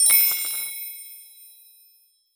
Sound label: multiplier activated; netent